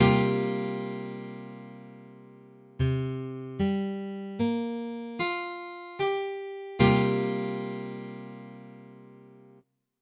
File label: C7sus4, Guitar Chords